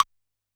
Wood Block H, Standard Kit, Yamaha PSR-E323

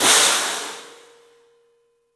CYFX, EFFECTCYMB; 3D Sound; 3D Drums

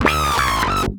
Bass FX

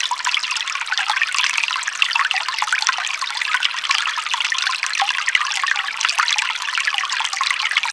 sfx, FX Atmos

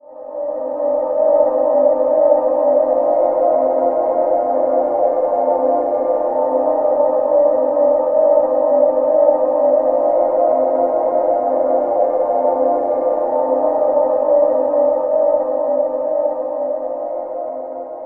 Frost Pad C, Ambient Pads; Zero-G Seismic Frequencies; 90 s Sample CDs

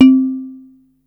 gamelan, Yamaha Motif ES drum machine; 300 Drum Machines; Yamaha Motif ES-Series

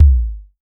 CHZ KICK HI; Drums In Your FACE!

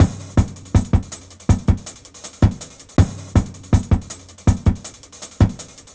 Train, breaks; Jungle; Jungle Warfare 1&2

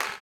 CLAP, blues & soul; ILIO; Vocal Planet

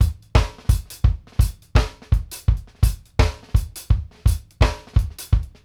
KICK ALL 4 + S, KD REGGAE 84; Sampleheads; New York City Drumworks VOL-1

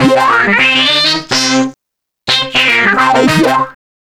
Horn Sect Effect

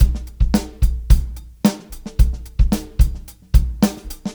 Live Beats, R&B Drums

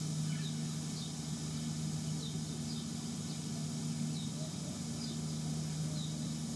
Ambience Loop, outdoor

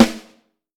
Vintage Snare Drum; Q Up Arts; Johnny.C's Vintage Snare Drum; 5X14 LUD

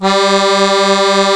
musette G2, accordion

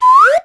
SLIDE W, SLIDE WHISTL

